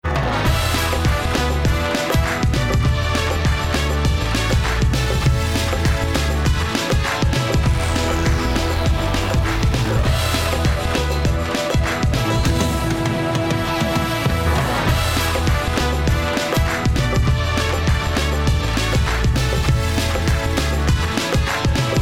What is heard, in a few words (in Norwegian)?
ringetone